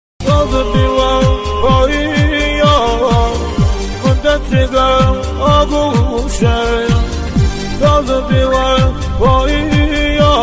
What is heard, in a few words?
Saz